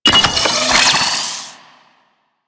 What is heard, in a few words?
Orbital Strike Warning Target; Warframe; Veilbreaker; Venkra